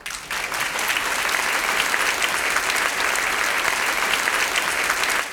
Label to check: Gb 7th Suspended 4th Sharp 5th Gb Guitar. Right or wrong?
Wrong